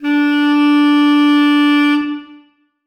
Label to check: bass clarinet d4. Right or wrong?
Right